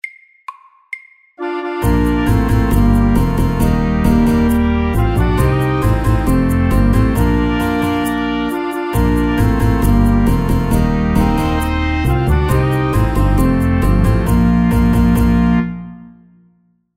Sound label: Mariachi band FAST 135